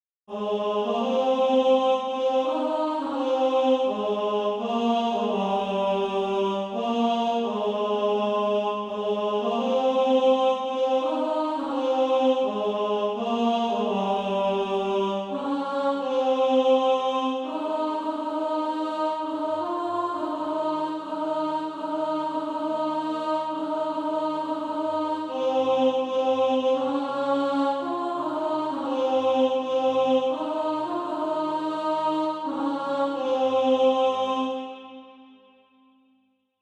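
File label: alto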